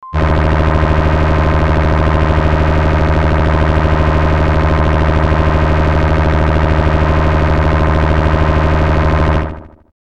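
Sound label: helicopter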